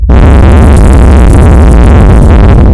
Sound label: bass blow